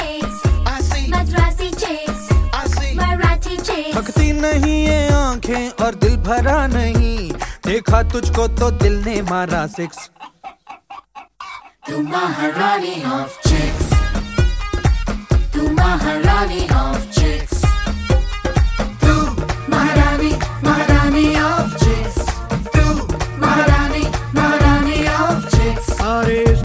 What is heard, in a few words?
ringtone bollywood ringtones